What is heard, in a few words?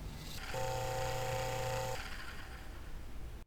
door open close; Looking-Glass